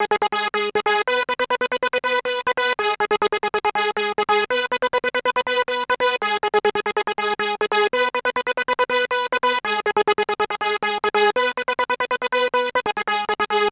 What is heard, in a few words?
Sol-7 G#; Vengeance Samples